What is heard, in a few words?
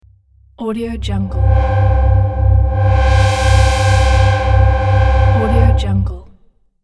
robotic intro sweep